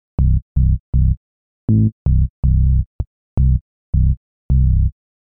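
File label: synth bass, ambient, 160, C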